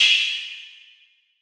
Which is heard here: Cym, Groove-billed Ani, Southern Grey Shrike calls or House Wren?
Cym